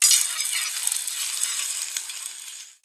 grindstone; Machine

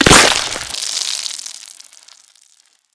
chop, Gore

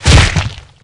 fall smash, Gore

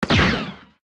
blaster, Guns Fire; Weapons

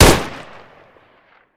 Minimi, Guns Fire

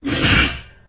metal scrape

